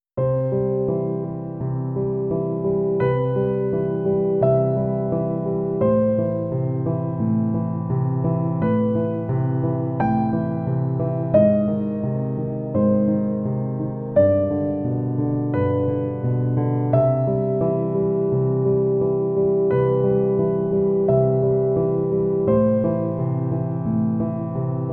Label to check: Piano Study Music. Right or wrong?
Right